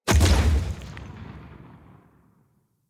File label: Wave Power Impact